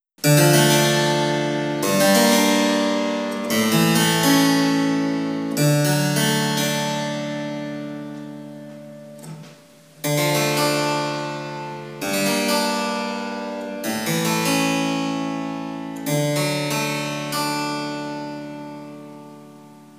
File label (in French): egal puis mesotonique, clavecin